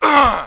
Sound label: die